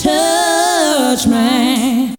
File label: VOC